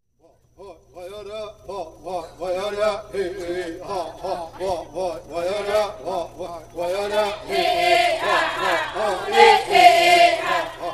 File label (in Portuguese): Canto das mulheres